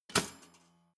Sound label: toaster pull down hard